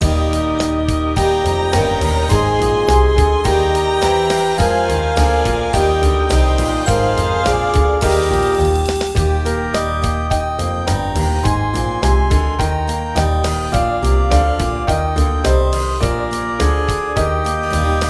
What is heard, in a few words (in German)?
Morgen kommt der Weihnachtsmann Playback